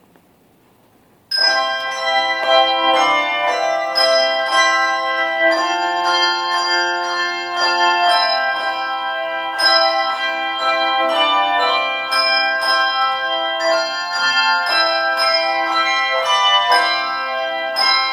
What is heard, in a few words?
handbells